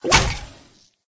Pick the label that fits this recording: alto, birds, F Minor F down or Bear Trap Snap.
Bear Trap Snap